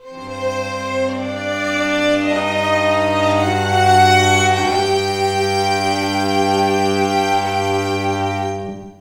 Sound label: Inna Chamber Gmin 160, Strings; Total Drum&Bass; 90 s Sample CDs